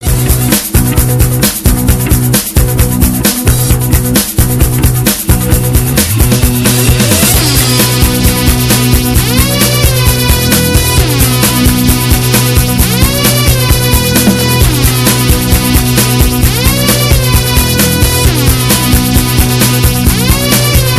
Tuner Tone; 3D Tones

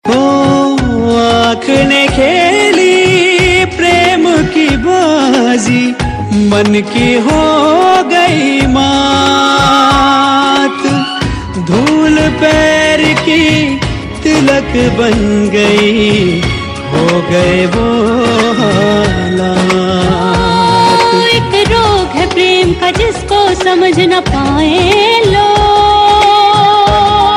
Male Title Tune; Zee TV; TV Serial Tone

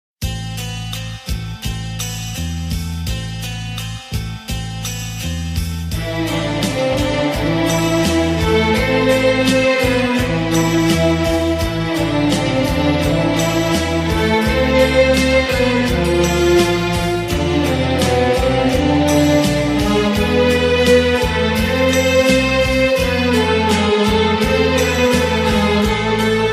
Moonlight Melody Theme

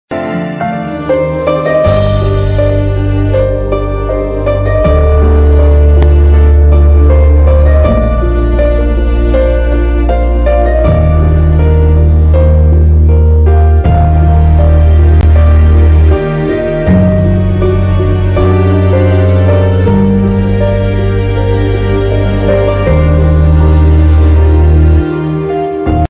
Sad Instrumental; Tv Serials; Sony

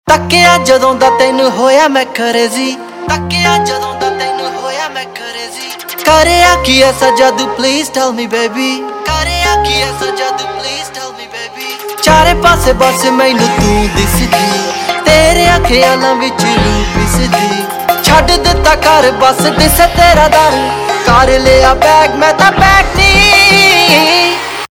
Ringtone, Punjabi Pop

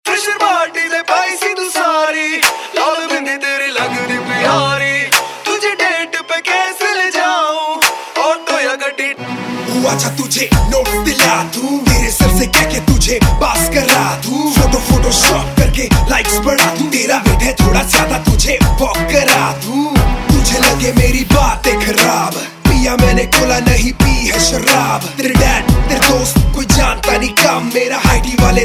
Ringtone, Punjabi Pop

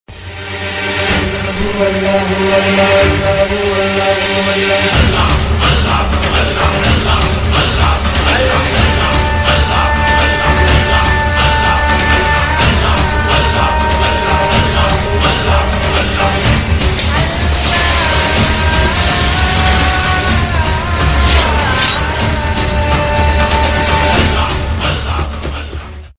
Fast Tune; Zee TV; Jodha Akbar

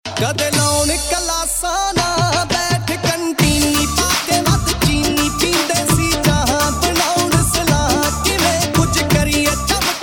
Dhol Mix; Punjabi Pop Ringtone

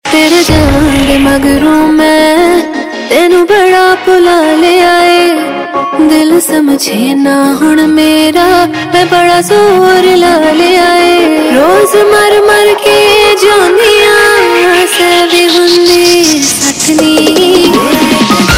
Ringtone, Punjabi

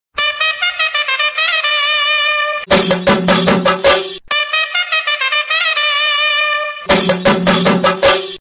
Ipl Horn Kerala; IPL T20 Special

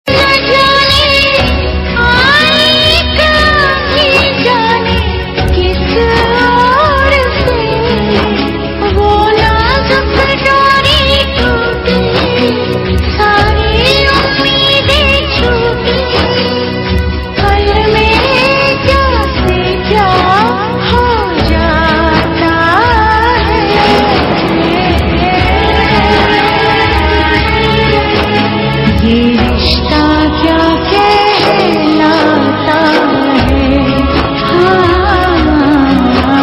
Female Title Tune; Yeh Rishta Kya Kehlata Hai

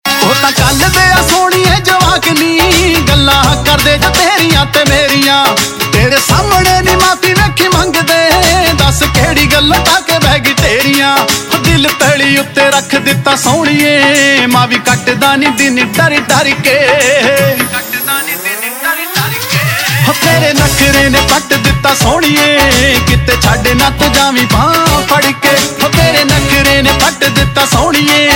Ringtone, Punjabi

